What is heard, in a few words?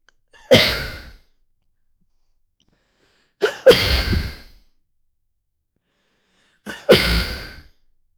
male sneezing